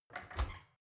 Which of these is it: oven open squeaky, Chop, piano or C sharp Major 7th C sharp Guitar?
oven open squeaky